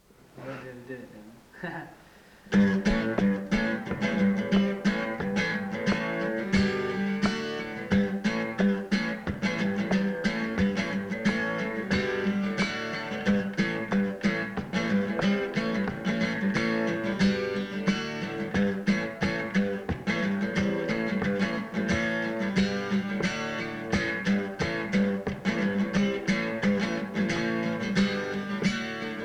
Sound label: acoustic instrumental